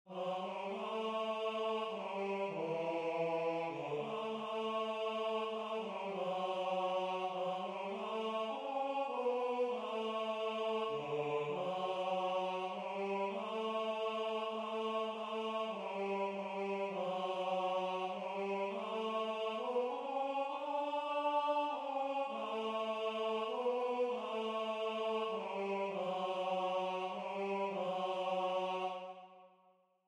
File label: Tenor